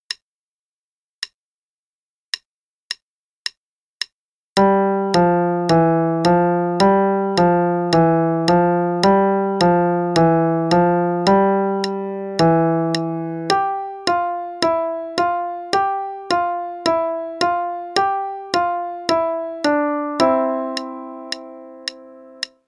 Legato River Melody (qn=108); Level 1 - 2nd Ed; Faber

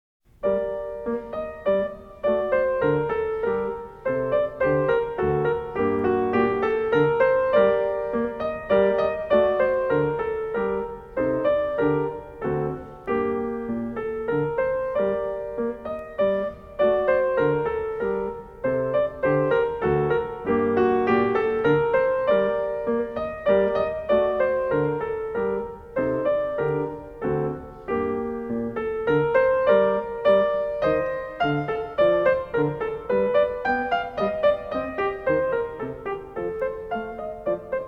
Menuet In G Minor; Magrath Book 06

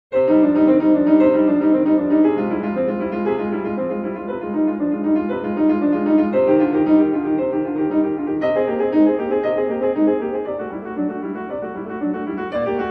Prelude in C minor; Marlais Book 8

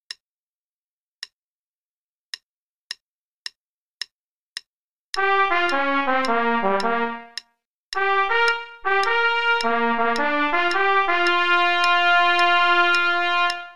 Play-a-long, Blues, qn=108

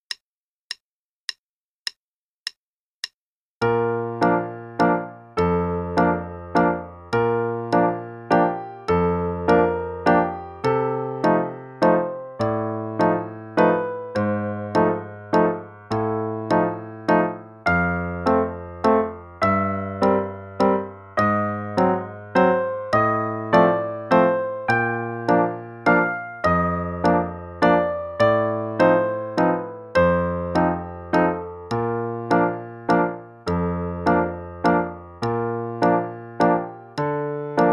Sleeping Beauty Waltz, both parts, qn=104; Adult Level 1

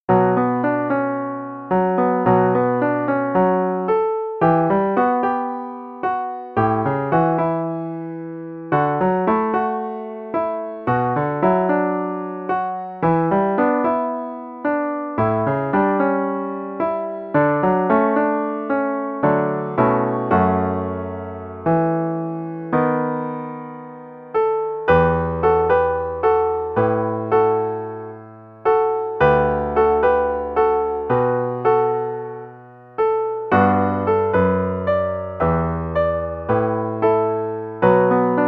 Theme from piano arr, qn=112

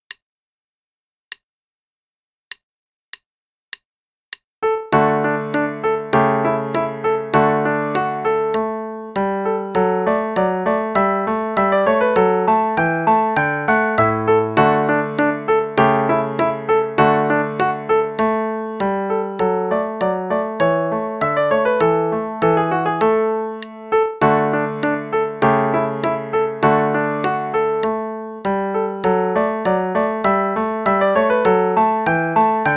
Play-along Allegro, qn=100; Reinagle; Level 2

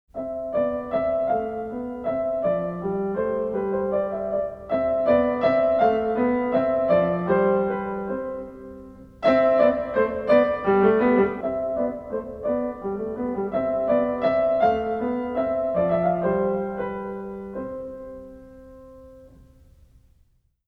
Minuet; Marlais Book 1

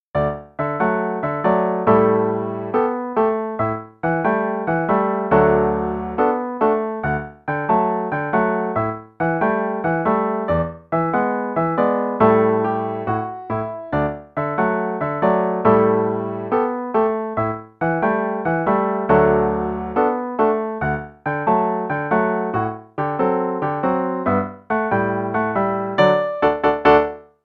Coconut Shuffle (no click, both parts, qn=140); Level 2A Performance - page 18

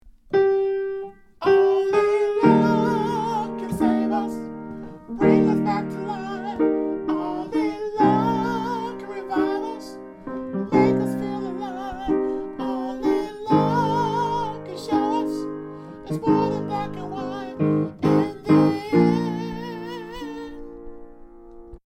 Soprano

